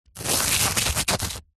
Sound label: foley dirt thin layer scrapes